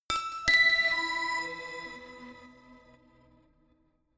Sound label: notification glockenspiel crazy fifth up